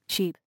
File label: sheep